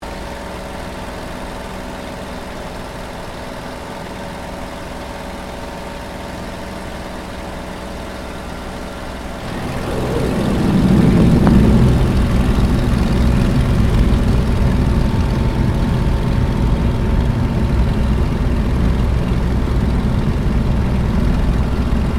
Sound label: Aircraft P-40 Kittyhawk Flying Interior Canopy, WW2 Kittyhawk or Warhawk fighter plane; 1940s; Airplanes